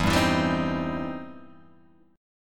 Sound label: E Minor Major 7th Sharp 5th, Guitar, Open G; 2,0,2,0,1,1; down